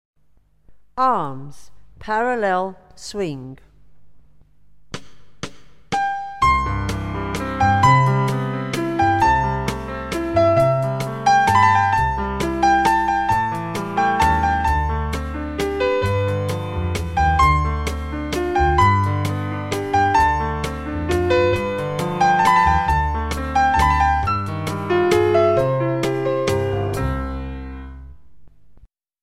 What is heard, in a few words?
primary tap arms